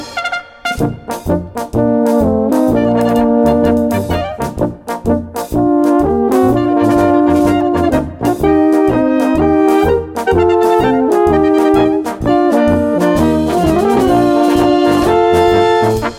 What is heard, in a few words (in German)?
Polka, alpenbrass